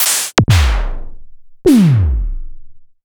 EMS VCS3 Drums

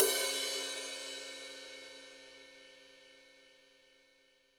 HiHat & Crash, Roland TD-20; HiHats

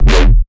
bass hit Dirt E; Virtual Riot

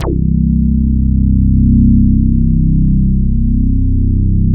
MKS BASS

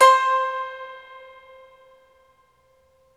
SU GZHENG, Earth Tones

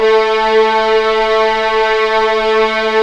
A3 MF, VIOLINS; East West; The Ultimate String Collection

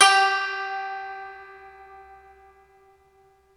SU GZHENG, Earth Tones

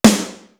STUDIO A, East West - Global Sounds; D4 BD SD TOM

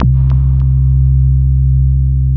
WALL MOOG, Synthy Tonal; Distored Reality

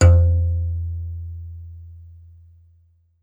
BASS KALI, African Melodic; E-MU Producer Series Vol. 7 – Old World Instruments; World Combos

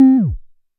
vermona drm1 mk3 tom

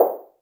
Percs, Elektron MachineDrum; SPS1 MKII

